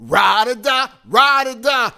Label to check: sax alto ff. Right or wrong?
Wrong